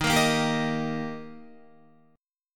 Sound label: D sharp Major D sharp Guitar, chord; strummed; 10,13,10,12,10,11; JGuitar